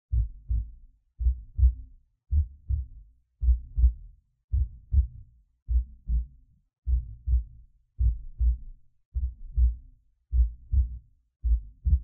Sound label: Hearbeat; VRChat; Unity Usable Avatars